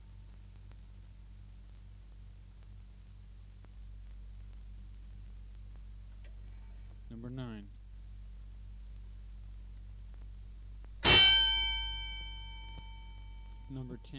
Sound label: Boxing Fight Bell